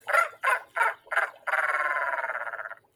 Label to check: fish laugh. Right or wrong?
Right